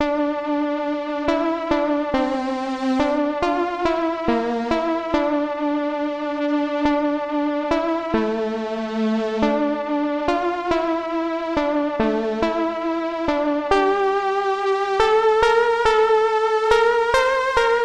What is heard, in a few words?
LEAD; Synthelium; Sonoluminescence